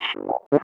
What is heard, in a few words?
rock; space kitty